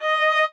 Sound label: Vl (D)